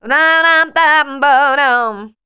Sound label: RHY LINE, Voice Spectral; RHYTM